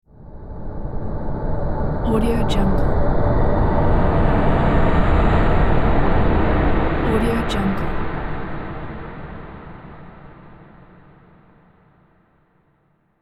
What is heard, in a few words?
space air release